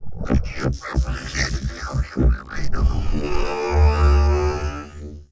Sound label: Effected Snarls, Vocals Humanoid; Sony Pictures Sound Effects Series Volumes 1-10; Sony Creative Software